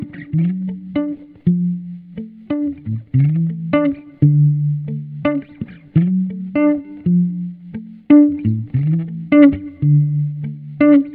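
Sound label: riff d maj 86bpm processed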